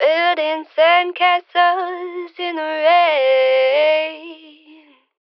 Phrase Dry - Sandcastles - 80 BPM C Min, vocals; Cymatics - Eternity Sample Pack; Phrases

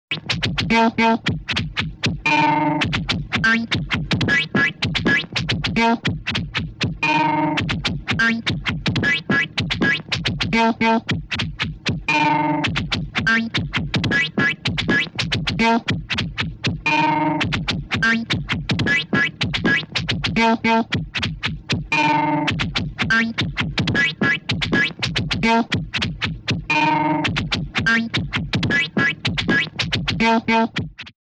Comedy Boink Motor; Vintage Cartoon; Foley

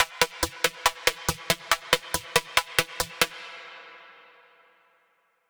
Terror Synth Loop F, Dubstep, Arp Loops; Synths; Cymatics; Dubstep Starter Pack

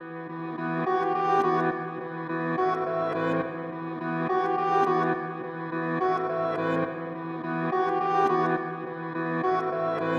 140 BPM E Min Reversed Piano; Loop Stems; Cymatics; Spitfire; 2022 Melody Collection; Drill

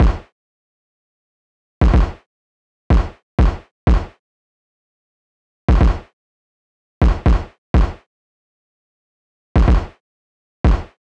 Drum Loop - 124 BPM Kick; Loop Stems; Cymatics; Anubis Drum Loop 1

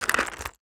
Gear Inventory UI; User Interface

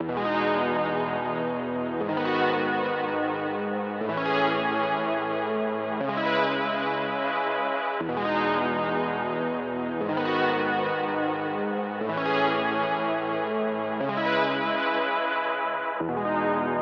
120 BPM C# Min Synth, RnB; Melodies; Loop Stems; Cymatics; GHOST (Beta Pack); Attachment

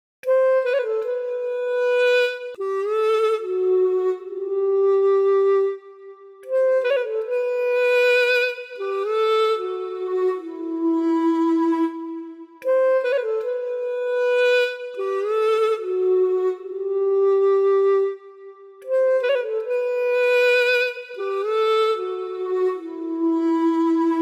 155 BPM E Min Flute, loop stems; Cymatics; 2022 Melody Collection Platinum Expansion - Pt 1; Dubai

